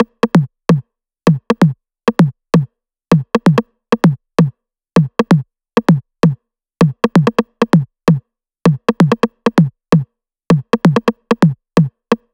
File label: 130BPM Kit; Vengeance Samples; Pressure 2 Kit